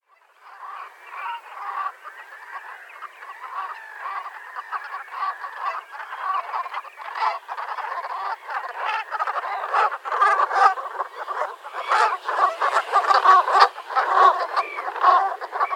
Bernache cravant

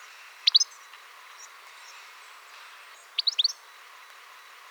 chardonneret appel

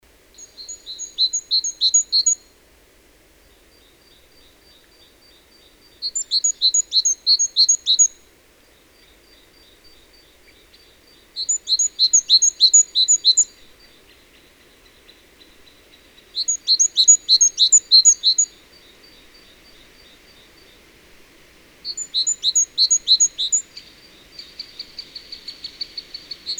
mesange charbonniere chant

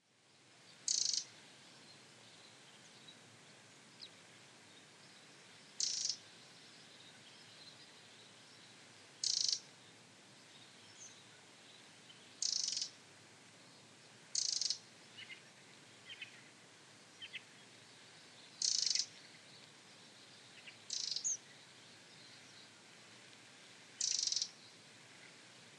troglodyte appel

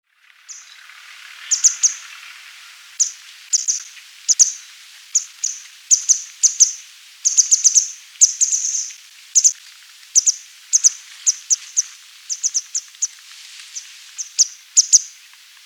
bergeronnette ruisseaux appel

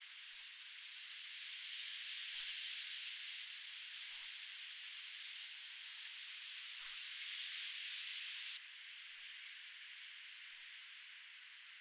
accenteur mouchet appel

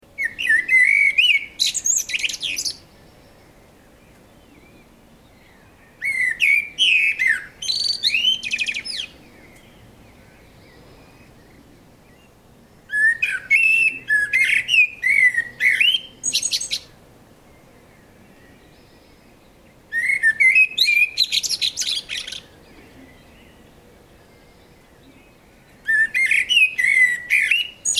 merle chant

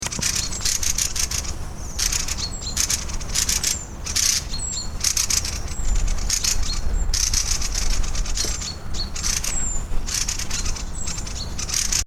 grive draine appel

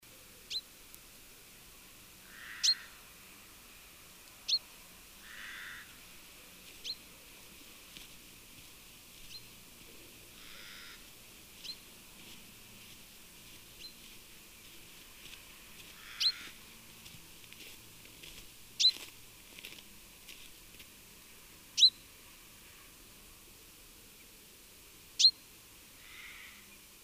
pinson appel

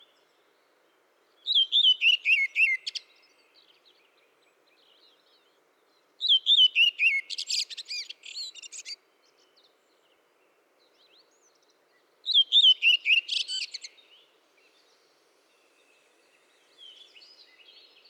grive mauvis chant

